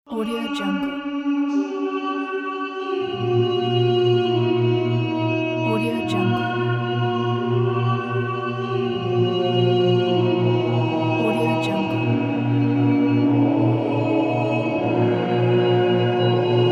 church choir

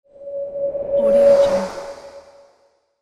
reverse transition

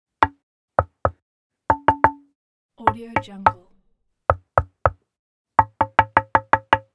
knock on wood

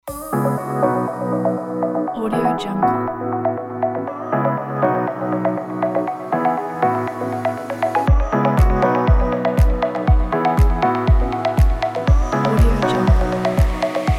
fashion deep house

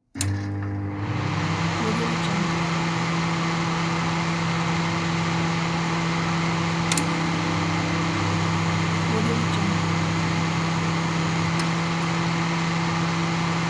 microwave; ducentije

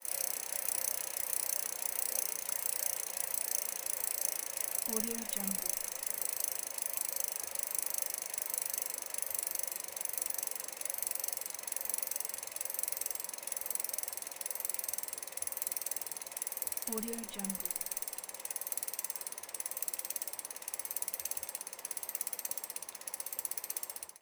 bicycle gears

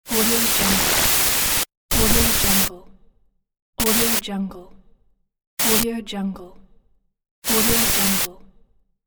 pixelated glitch transitions

